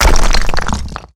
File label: dig